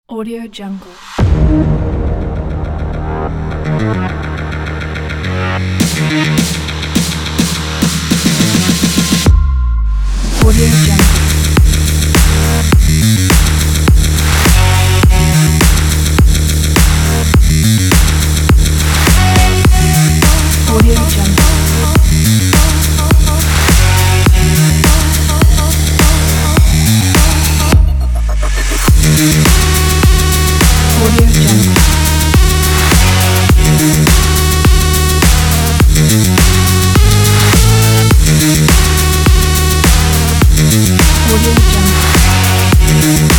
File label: electro